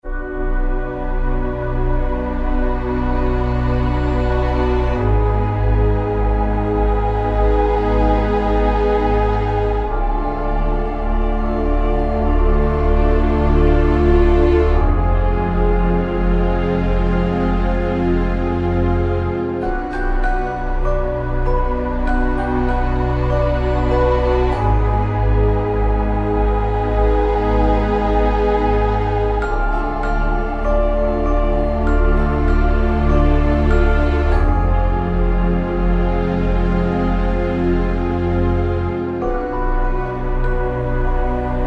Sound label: Scary; Scary Pack